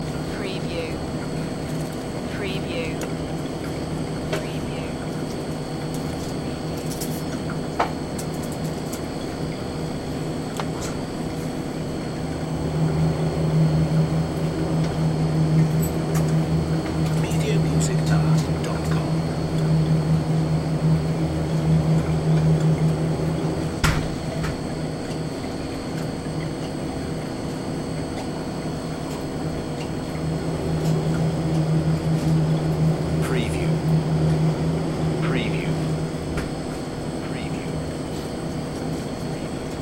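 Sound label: Convenience Store Ambience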